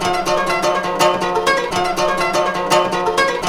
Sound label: KORA; Zero G - Ethnic